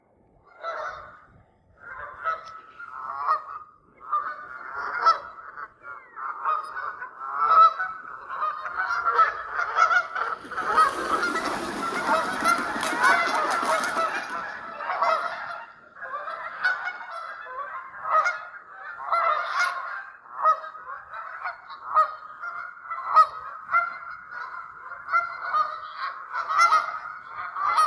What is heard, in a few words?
Voice Holland House Rd, geese